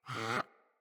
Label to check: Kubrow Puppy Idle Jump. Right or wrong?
Right